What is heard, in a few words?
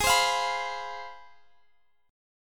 C Major 7th Suspended 4th Sharp 5th, G sharp, chord